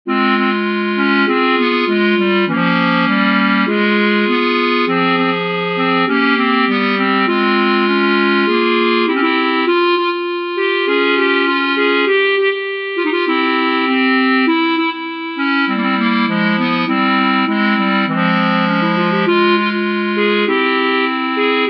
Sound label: A Trio for Thirds, Three Clarinets